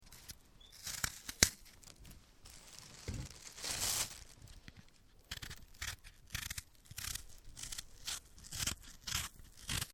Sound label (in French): eplucher pomme